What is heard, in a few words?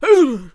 Death Vocals; Flintlock